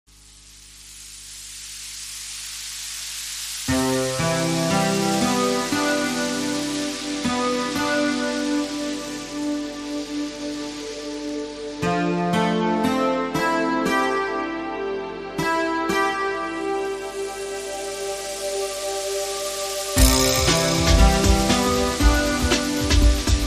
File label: Rainstick